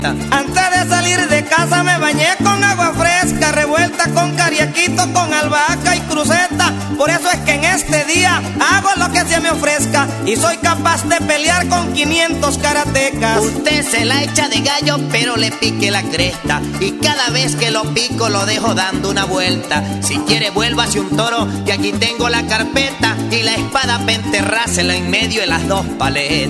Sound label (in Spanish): contrapunteo; latino